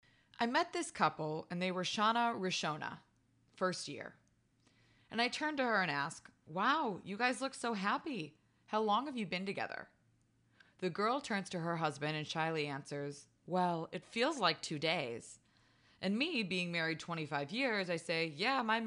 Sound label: laugh; Joke